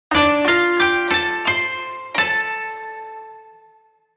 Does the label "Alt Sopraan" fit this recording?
No